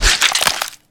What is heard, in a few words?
break, combat